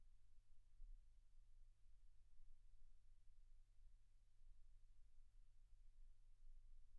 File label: silence 48kHz